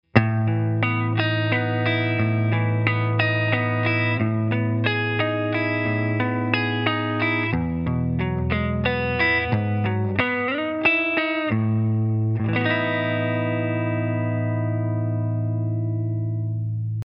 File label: Electric; Surge